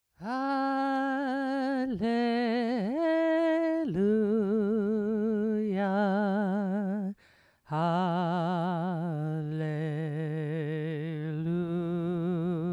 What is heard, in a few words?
hallelujah chorus